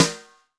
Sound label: Snr Brush Hit, Alesis D4